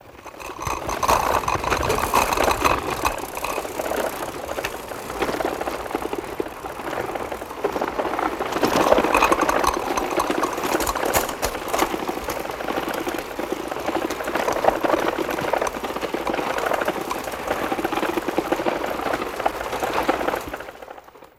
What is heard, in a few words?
Bicycle By Peddling